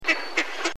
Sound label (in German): Ente; Tierstimmen